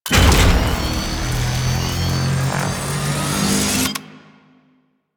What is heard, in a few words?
Ship BFG Charge, Vehicles, Weapons; Warframe; DE; RailJack; RailJackWeapons